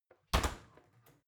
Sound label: oven close baking sheet ringing